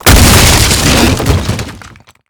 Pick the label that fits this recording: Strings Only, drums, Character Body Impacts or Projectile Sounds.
Projectile Sounds